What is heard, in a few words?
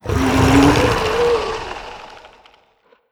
breath, zombie blind; creatures; scripted intro